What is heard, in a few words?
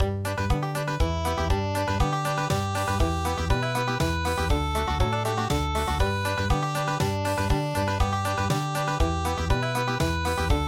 German Dance Song Country